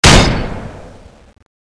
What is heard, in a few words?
gatling; weapons